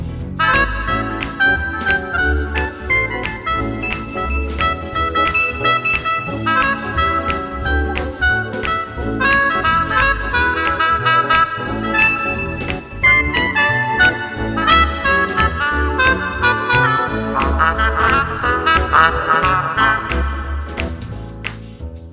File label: Jazz Quartet